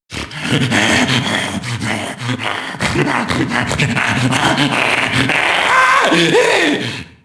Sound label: junkie noise; enemy; Max Payne